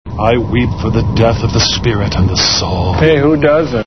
weep

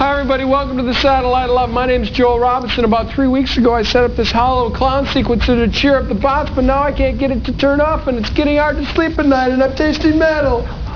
sequencer

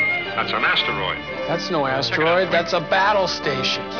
riff

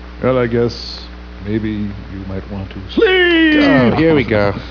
sleep

